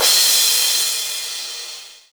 Crash, Drums, Cymbal; One Shots; Ableton Live 12 Lite; Core Library

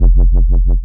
Synth Bass Sub Wobble F

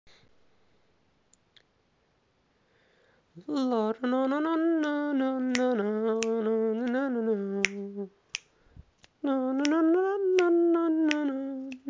Lost Catchy Tune + Rythm

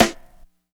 Snare, drums; Ableton Live 12 Lite Core Library

